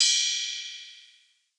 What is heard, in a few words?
Ride; Cymatics; Eternity Sample Pack; Drum One Shots; Cymbals